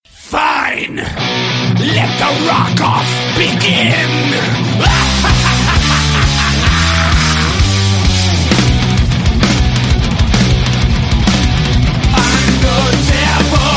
sonnerie version courte

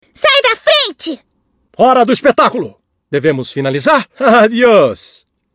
fantaisie improptu

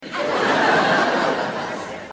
rire public

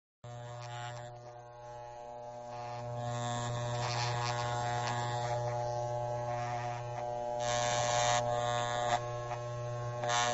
tondeuse

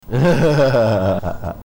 rire niais; zarma

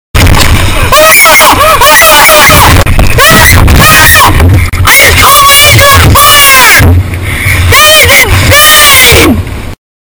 enfant espagnol enragé

